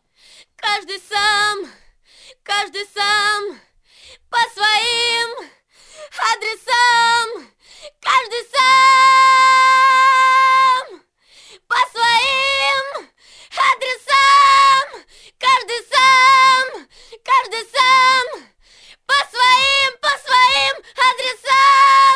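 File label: chorus scream, acapellas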